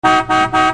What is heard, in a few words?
Car Horn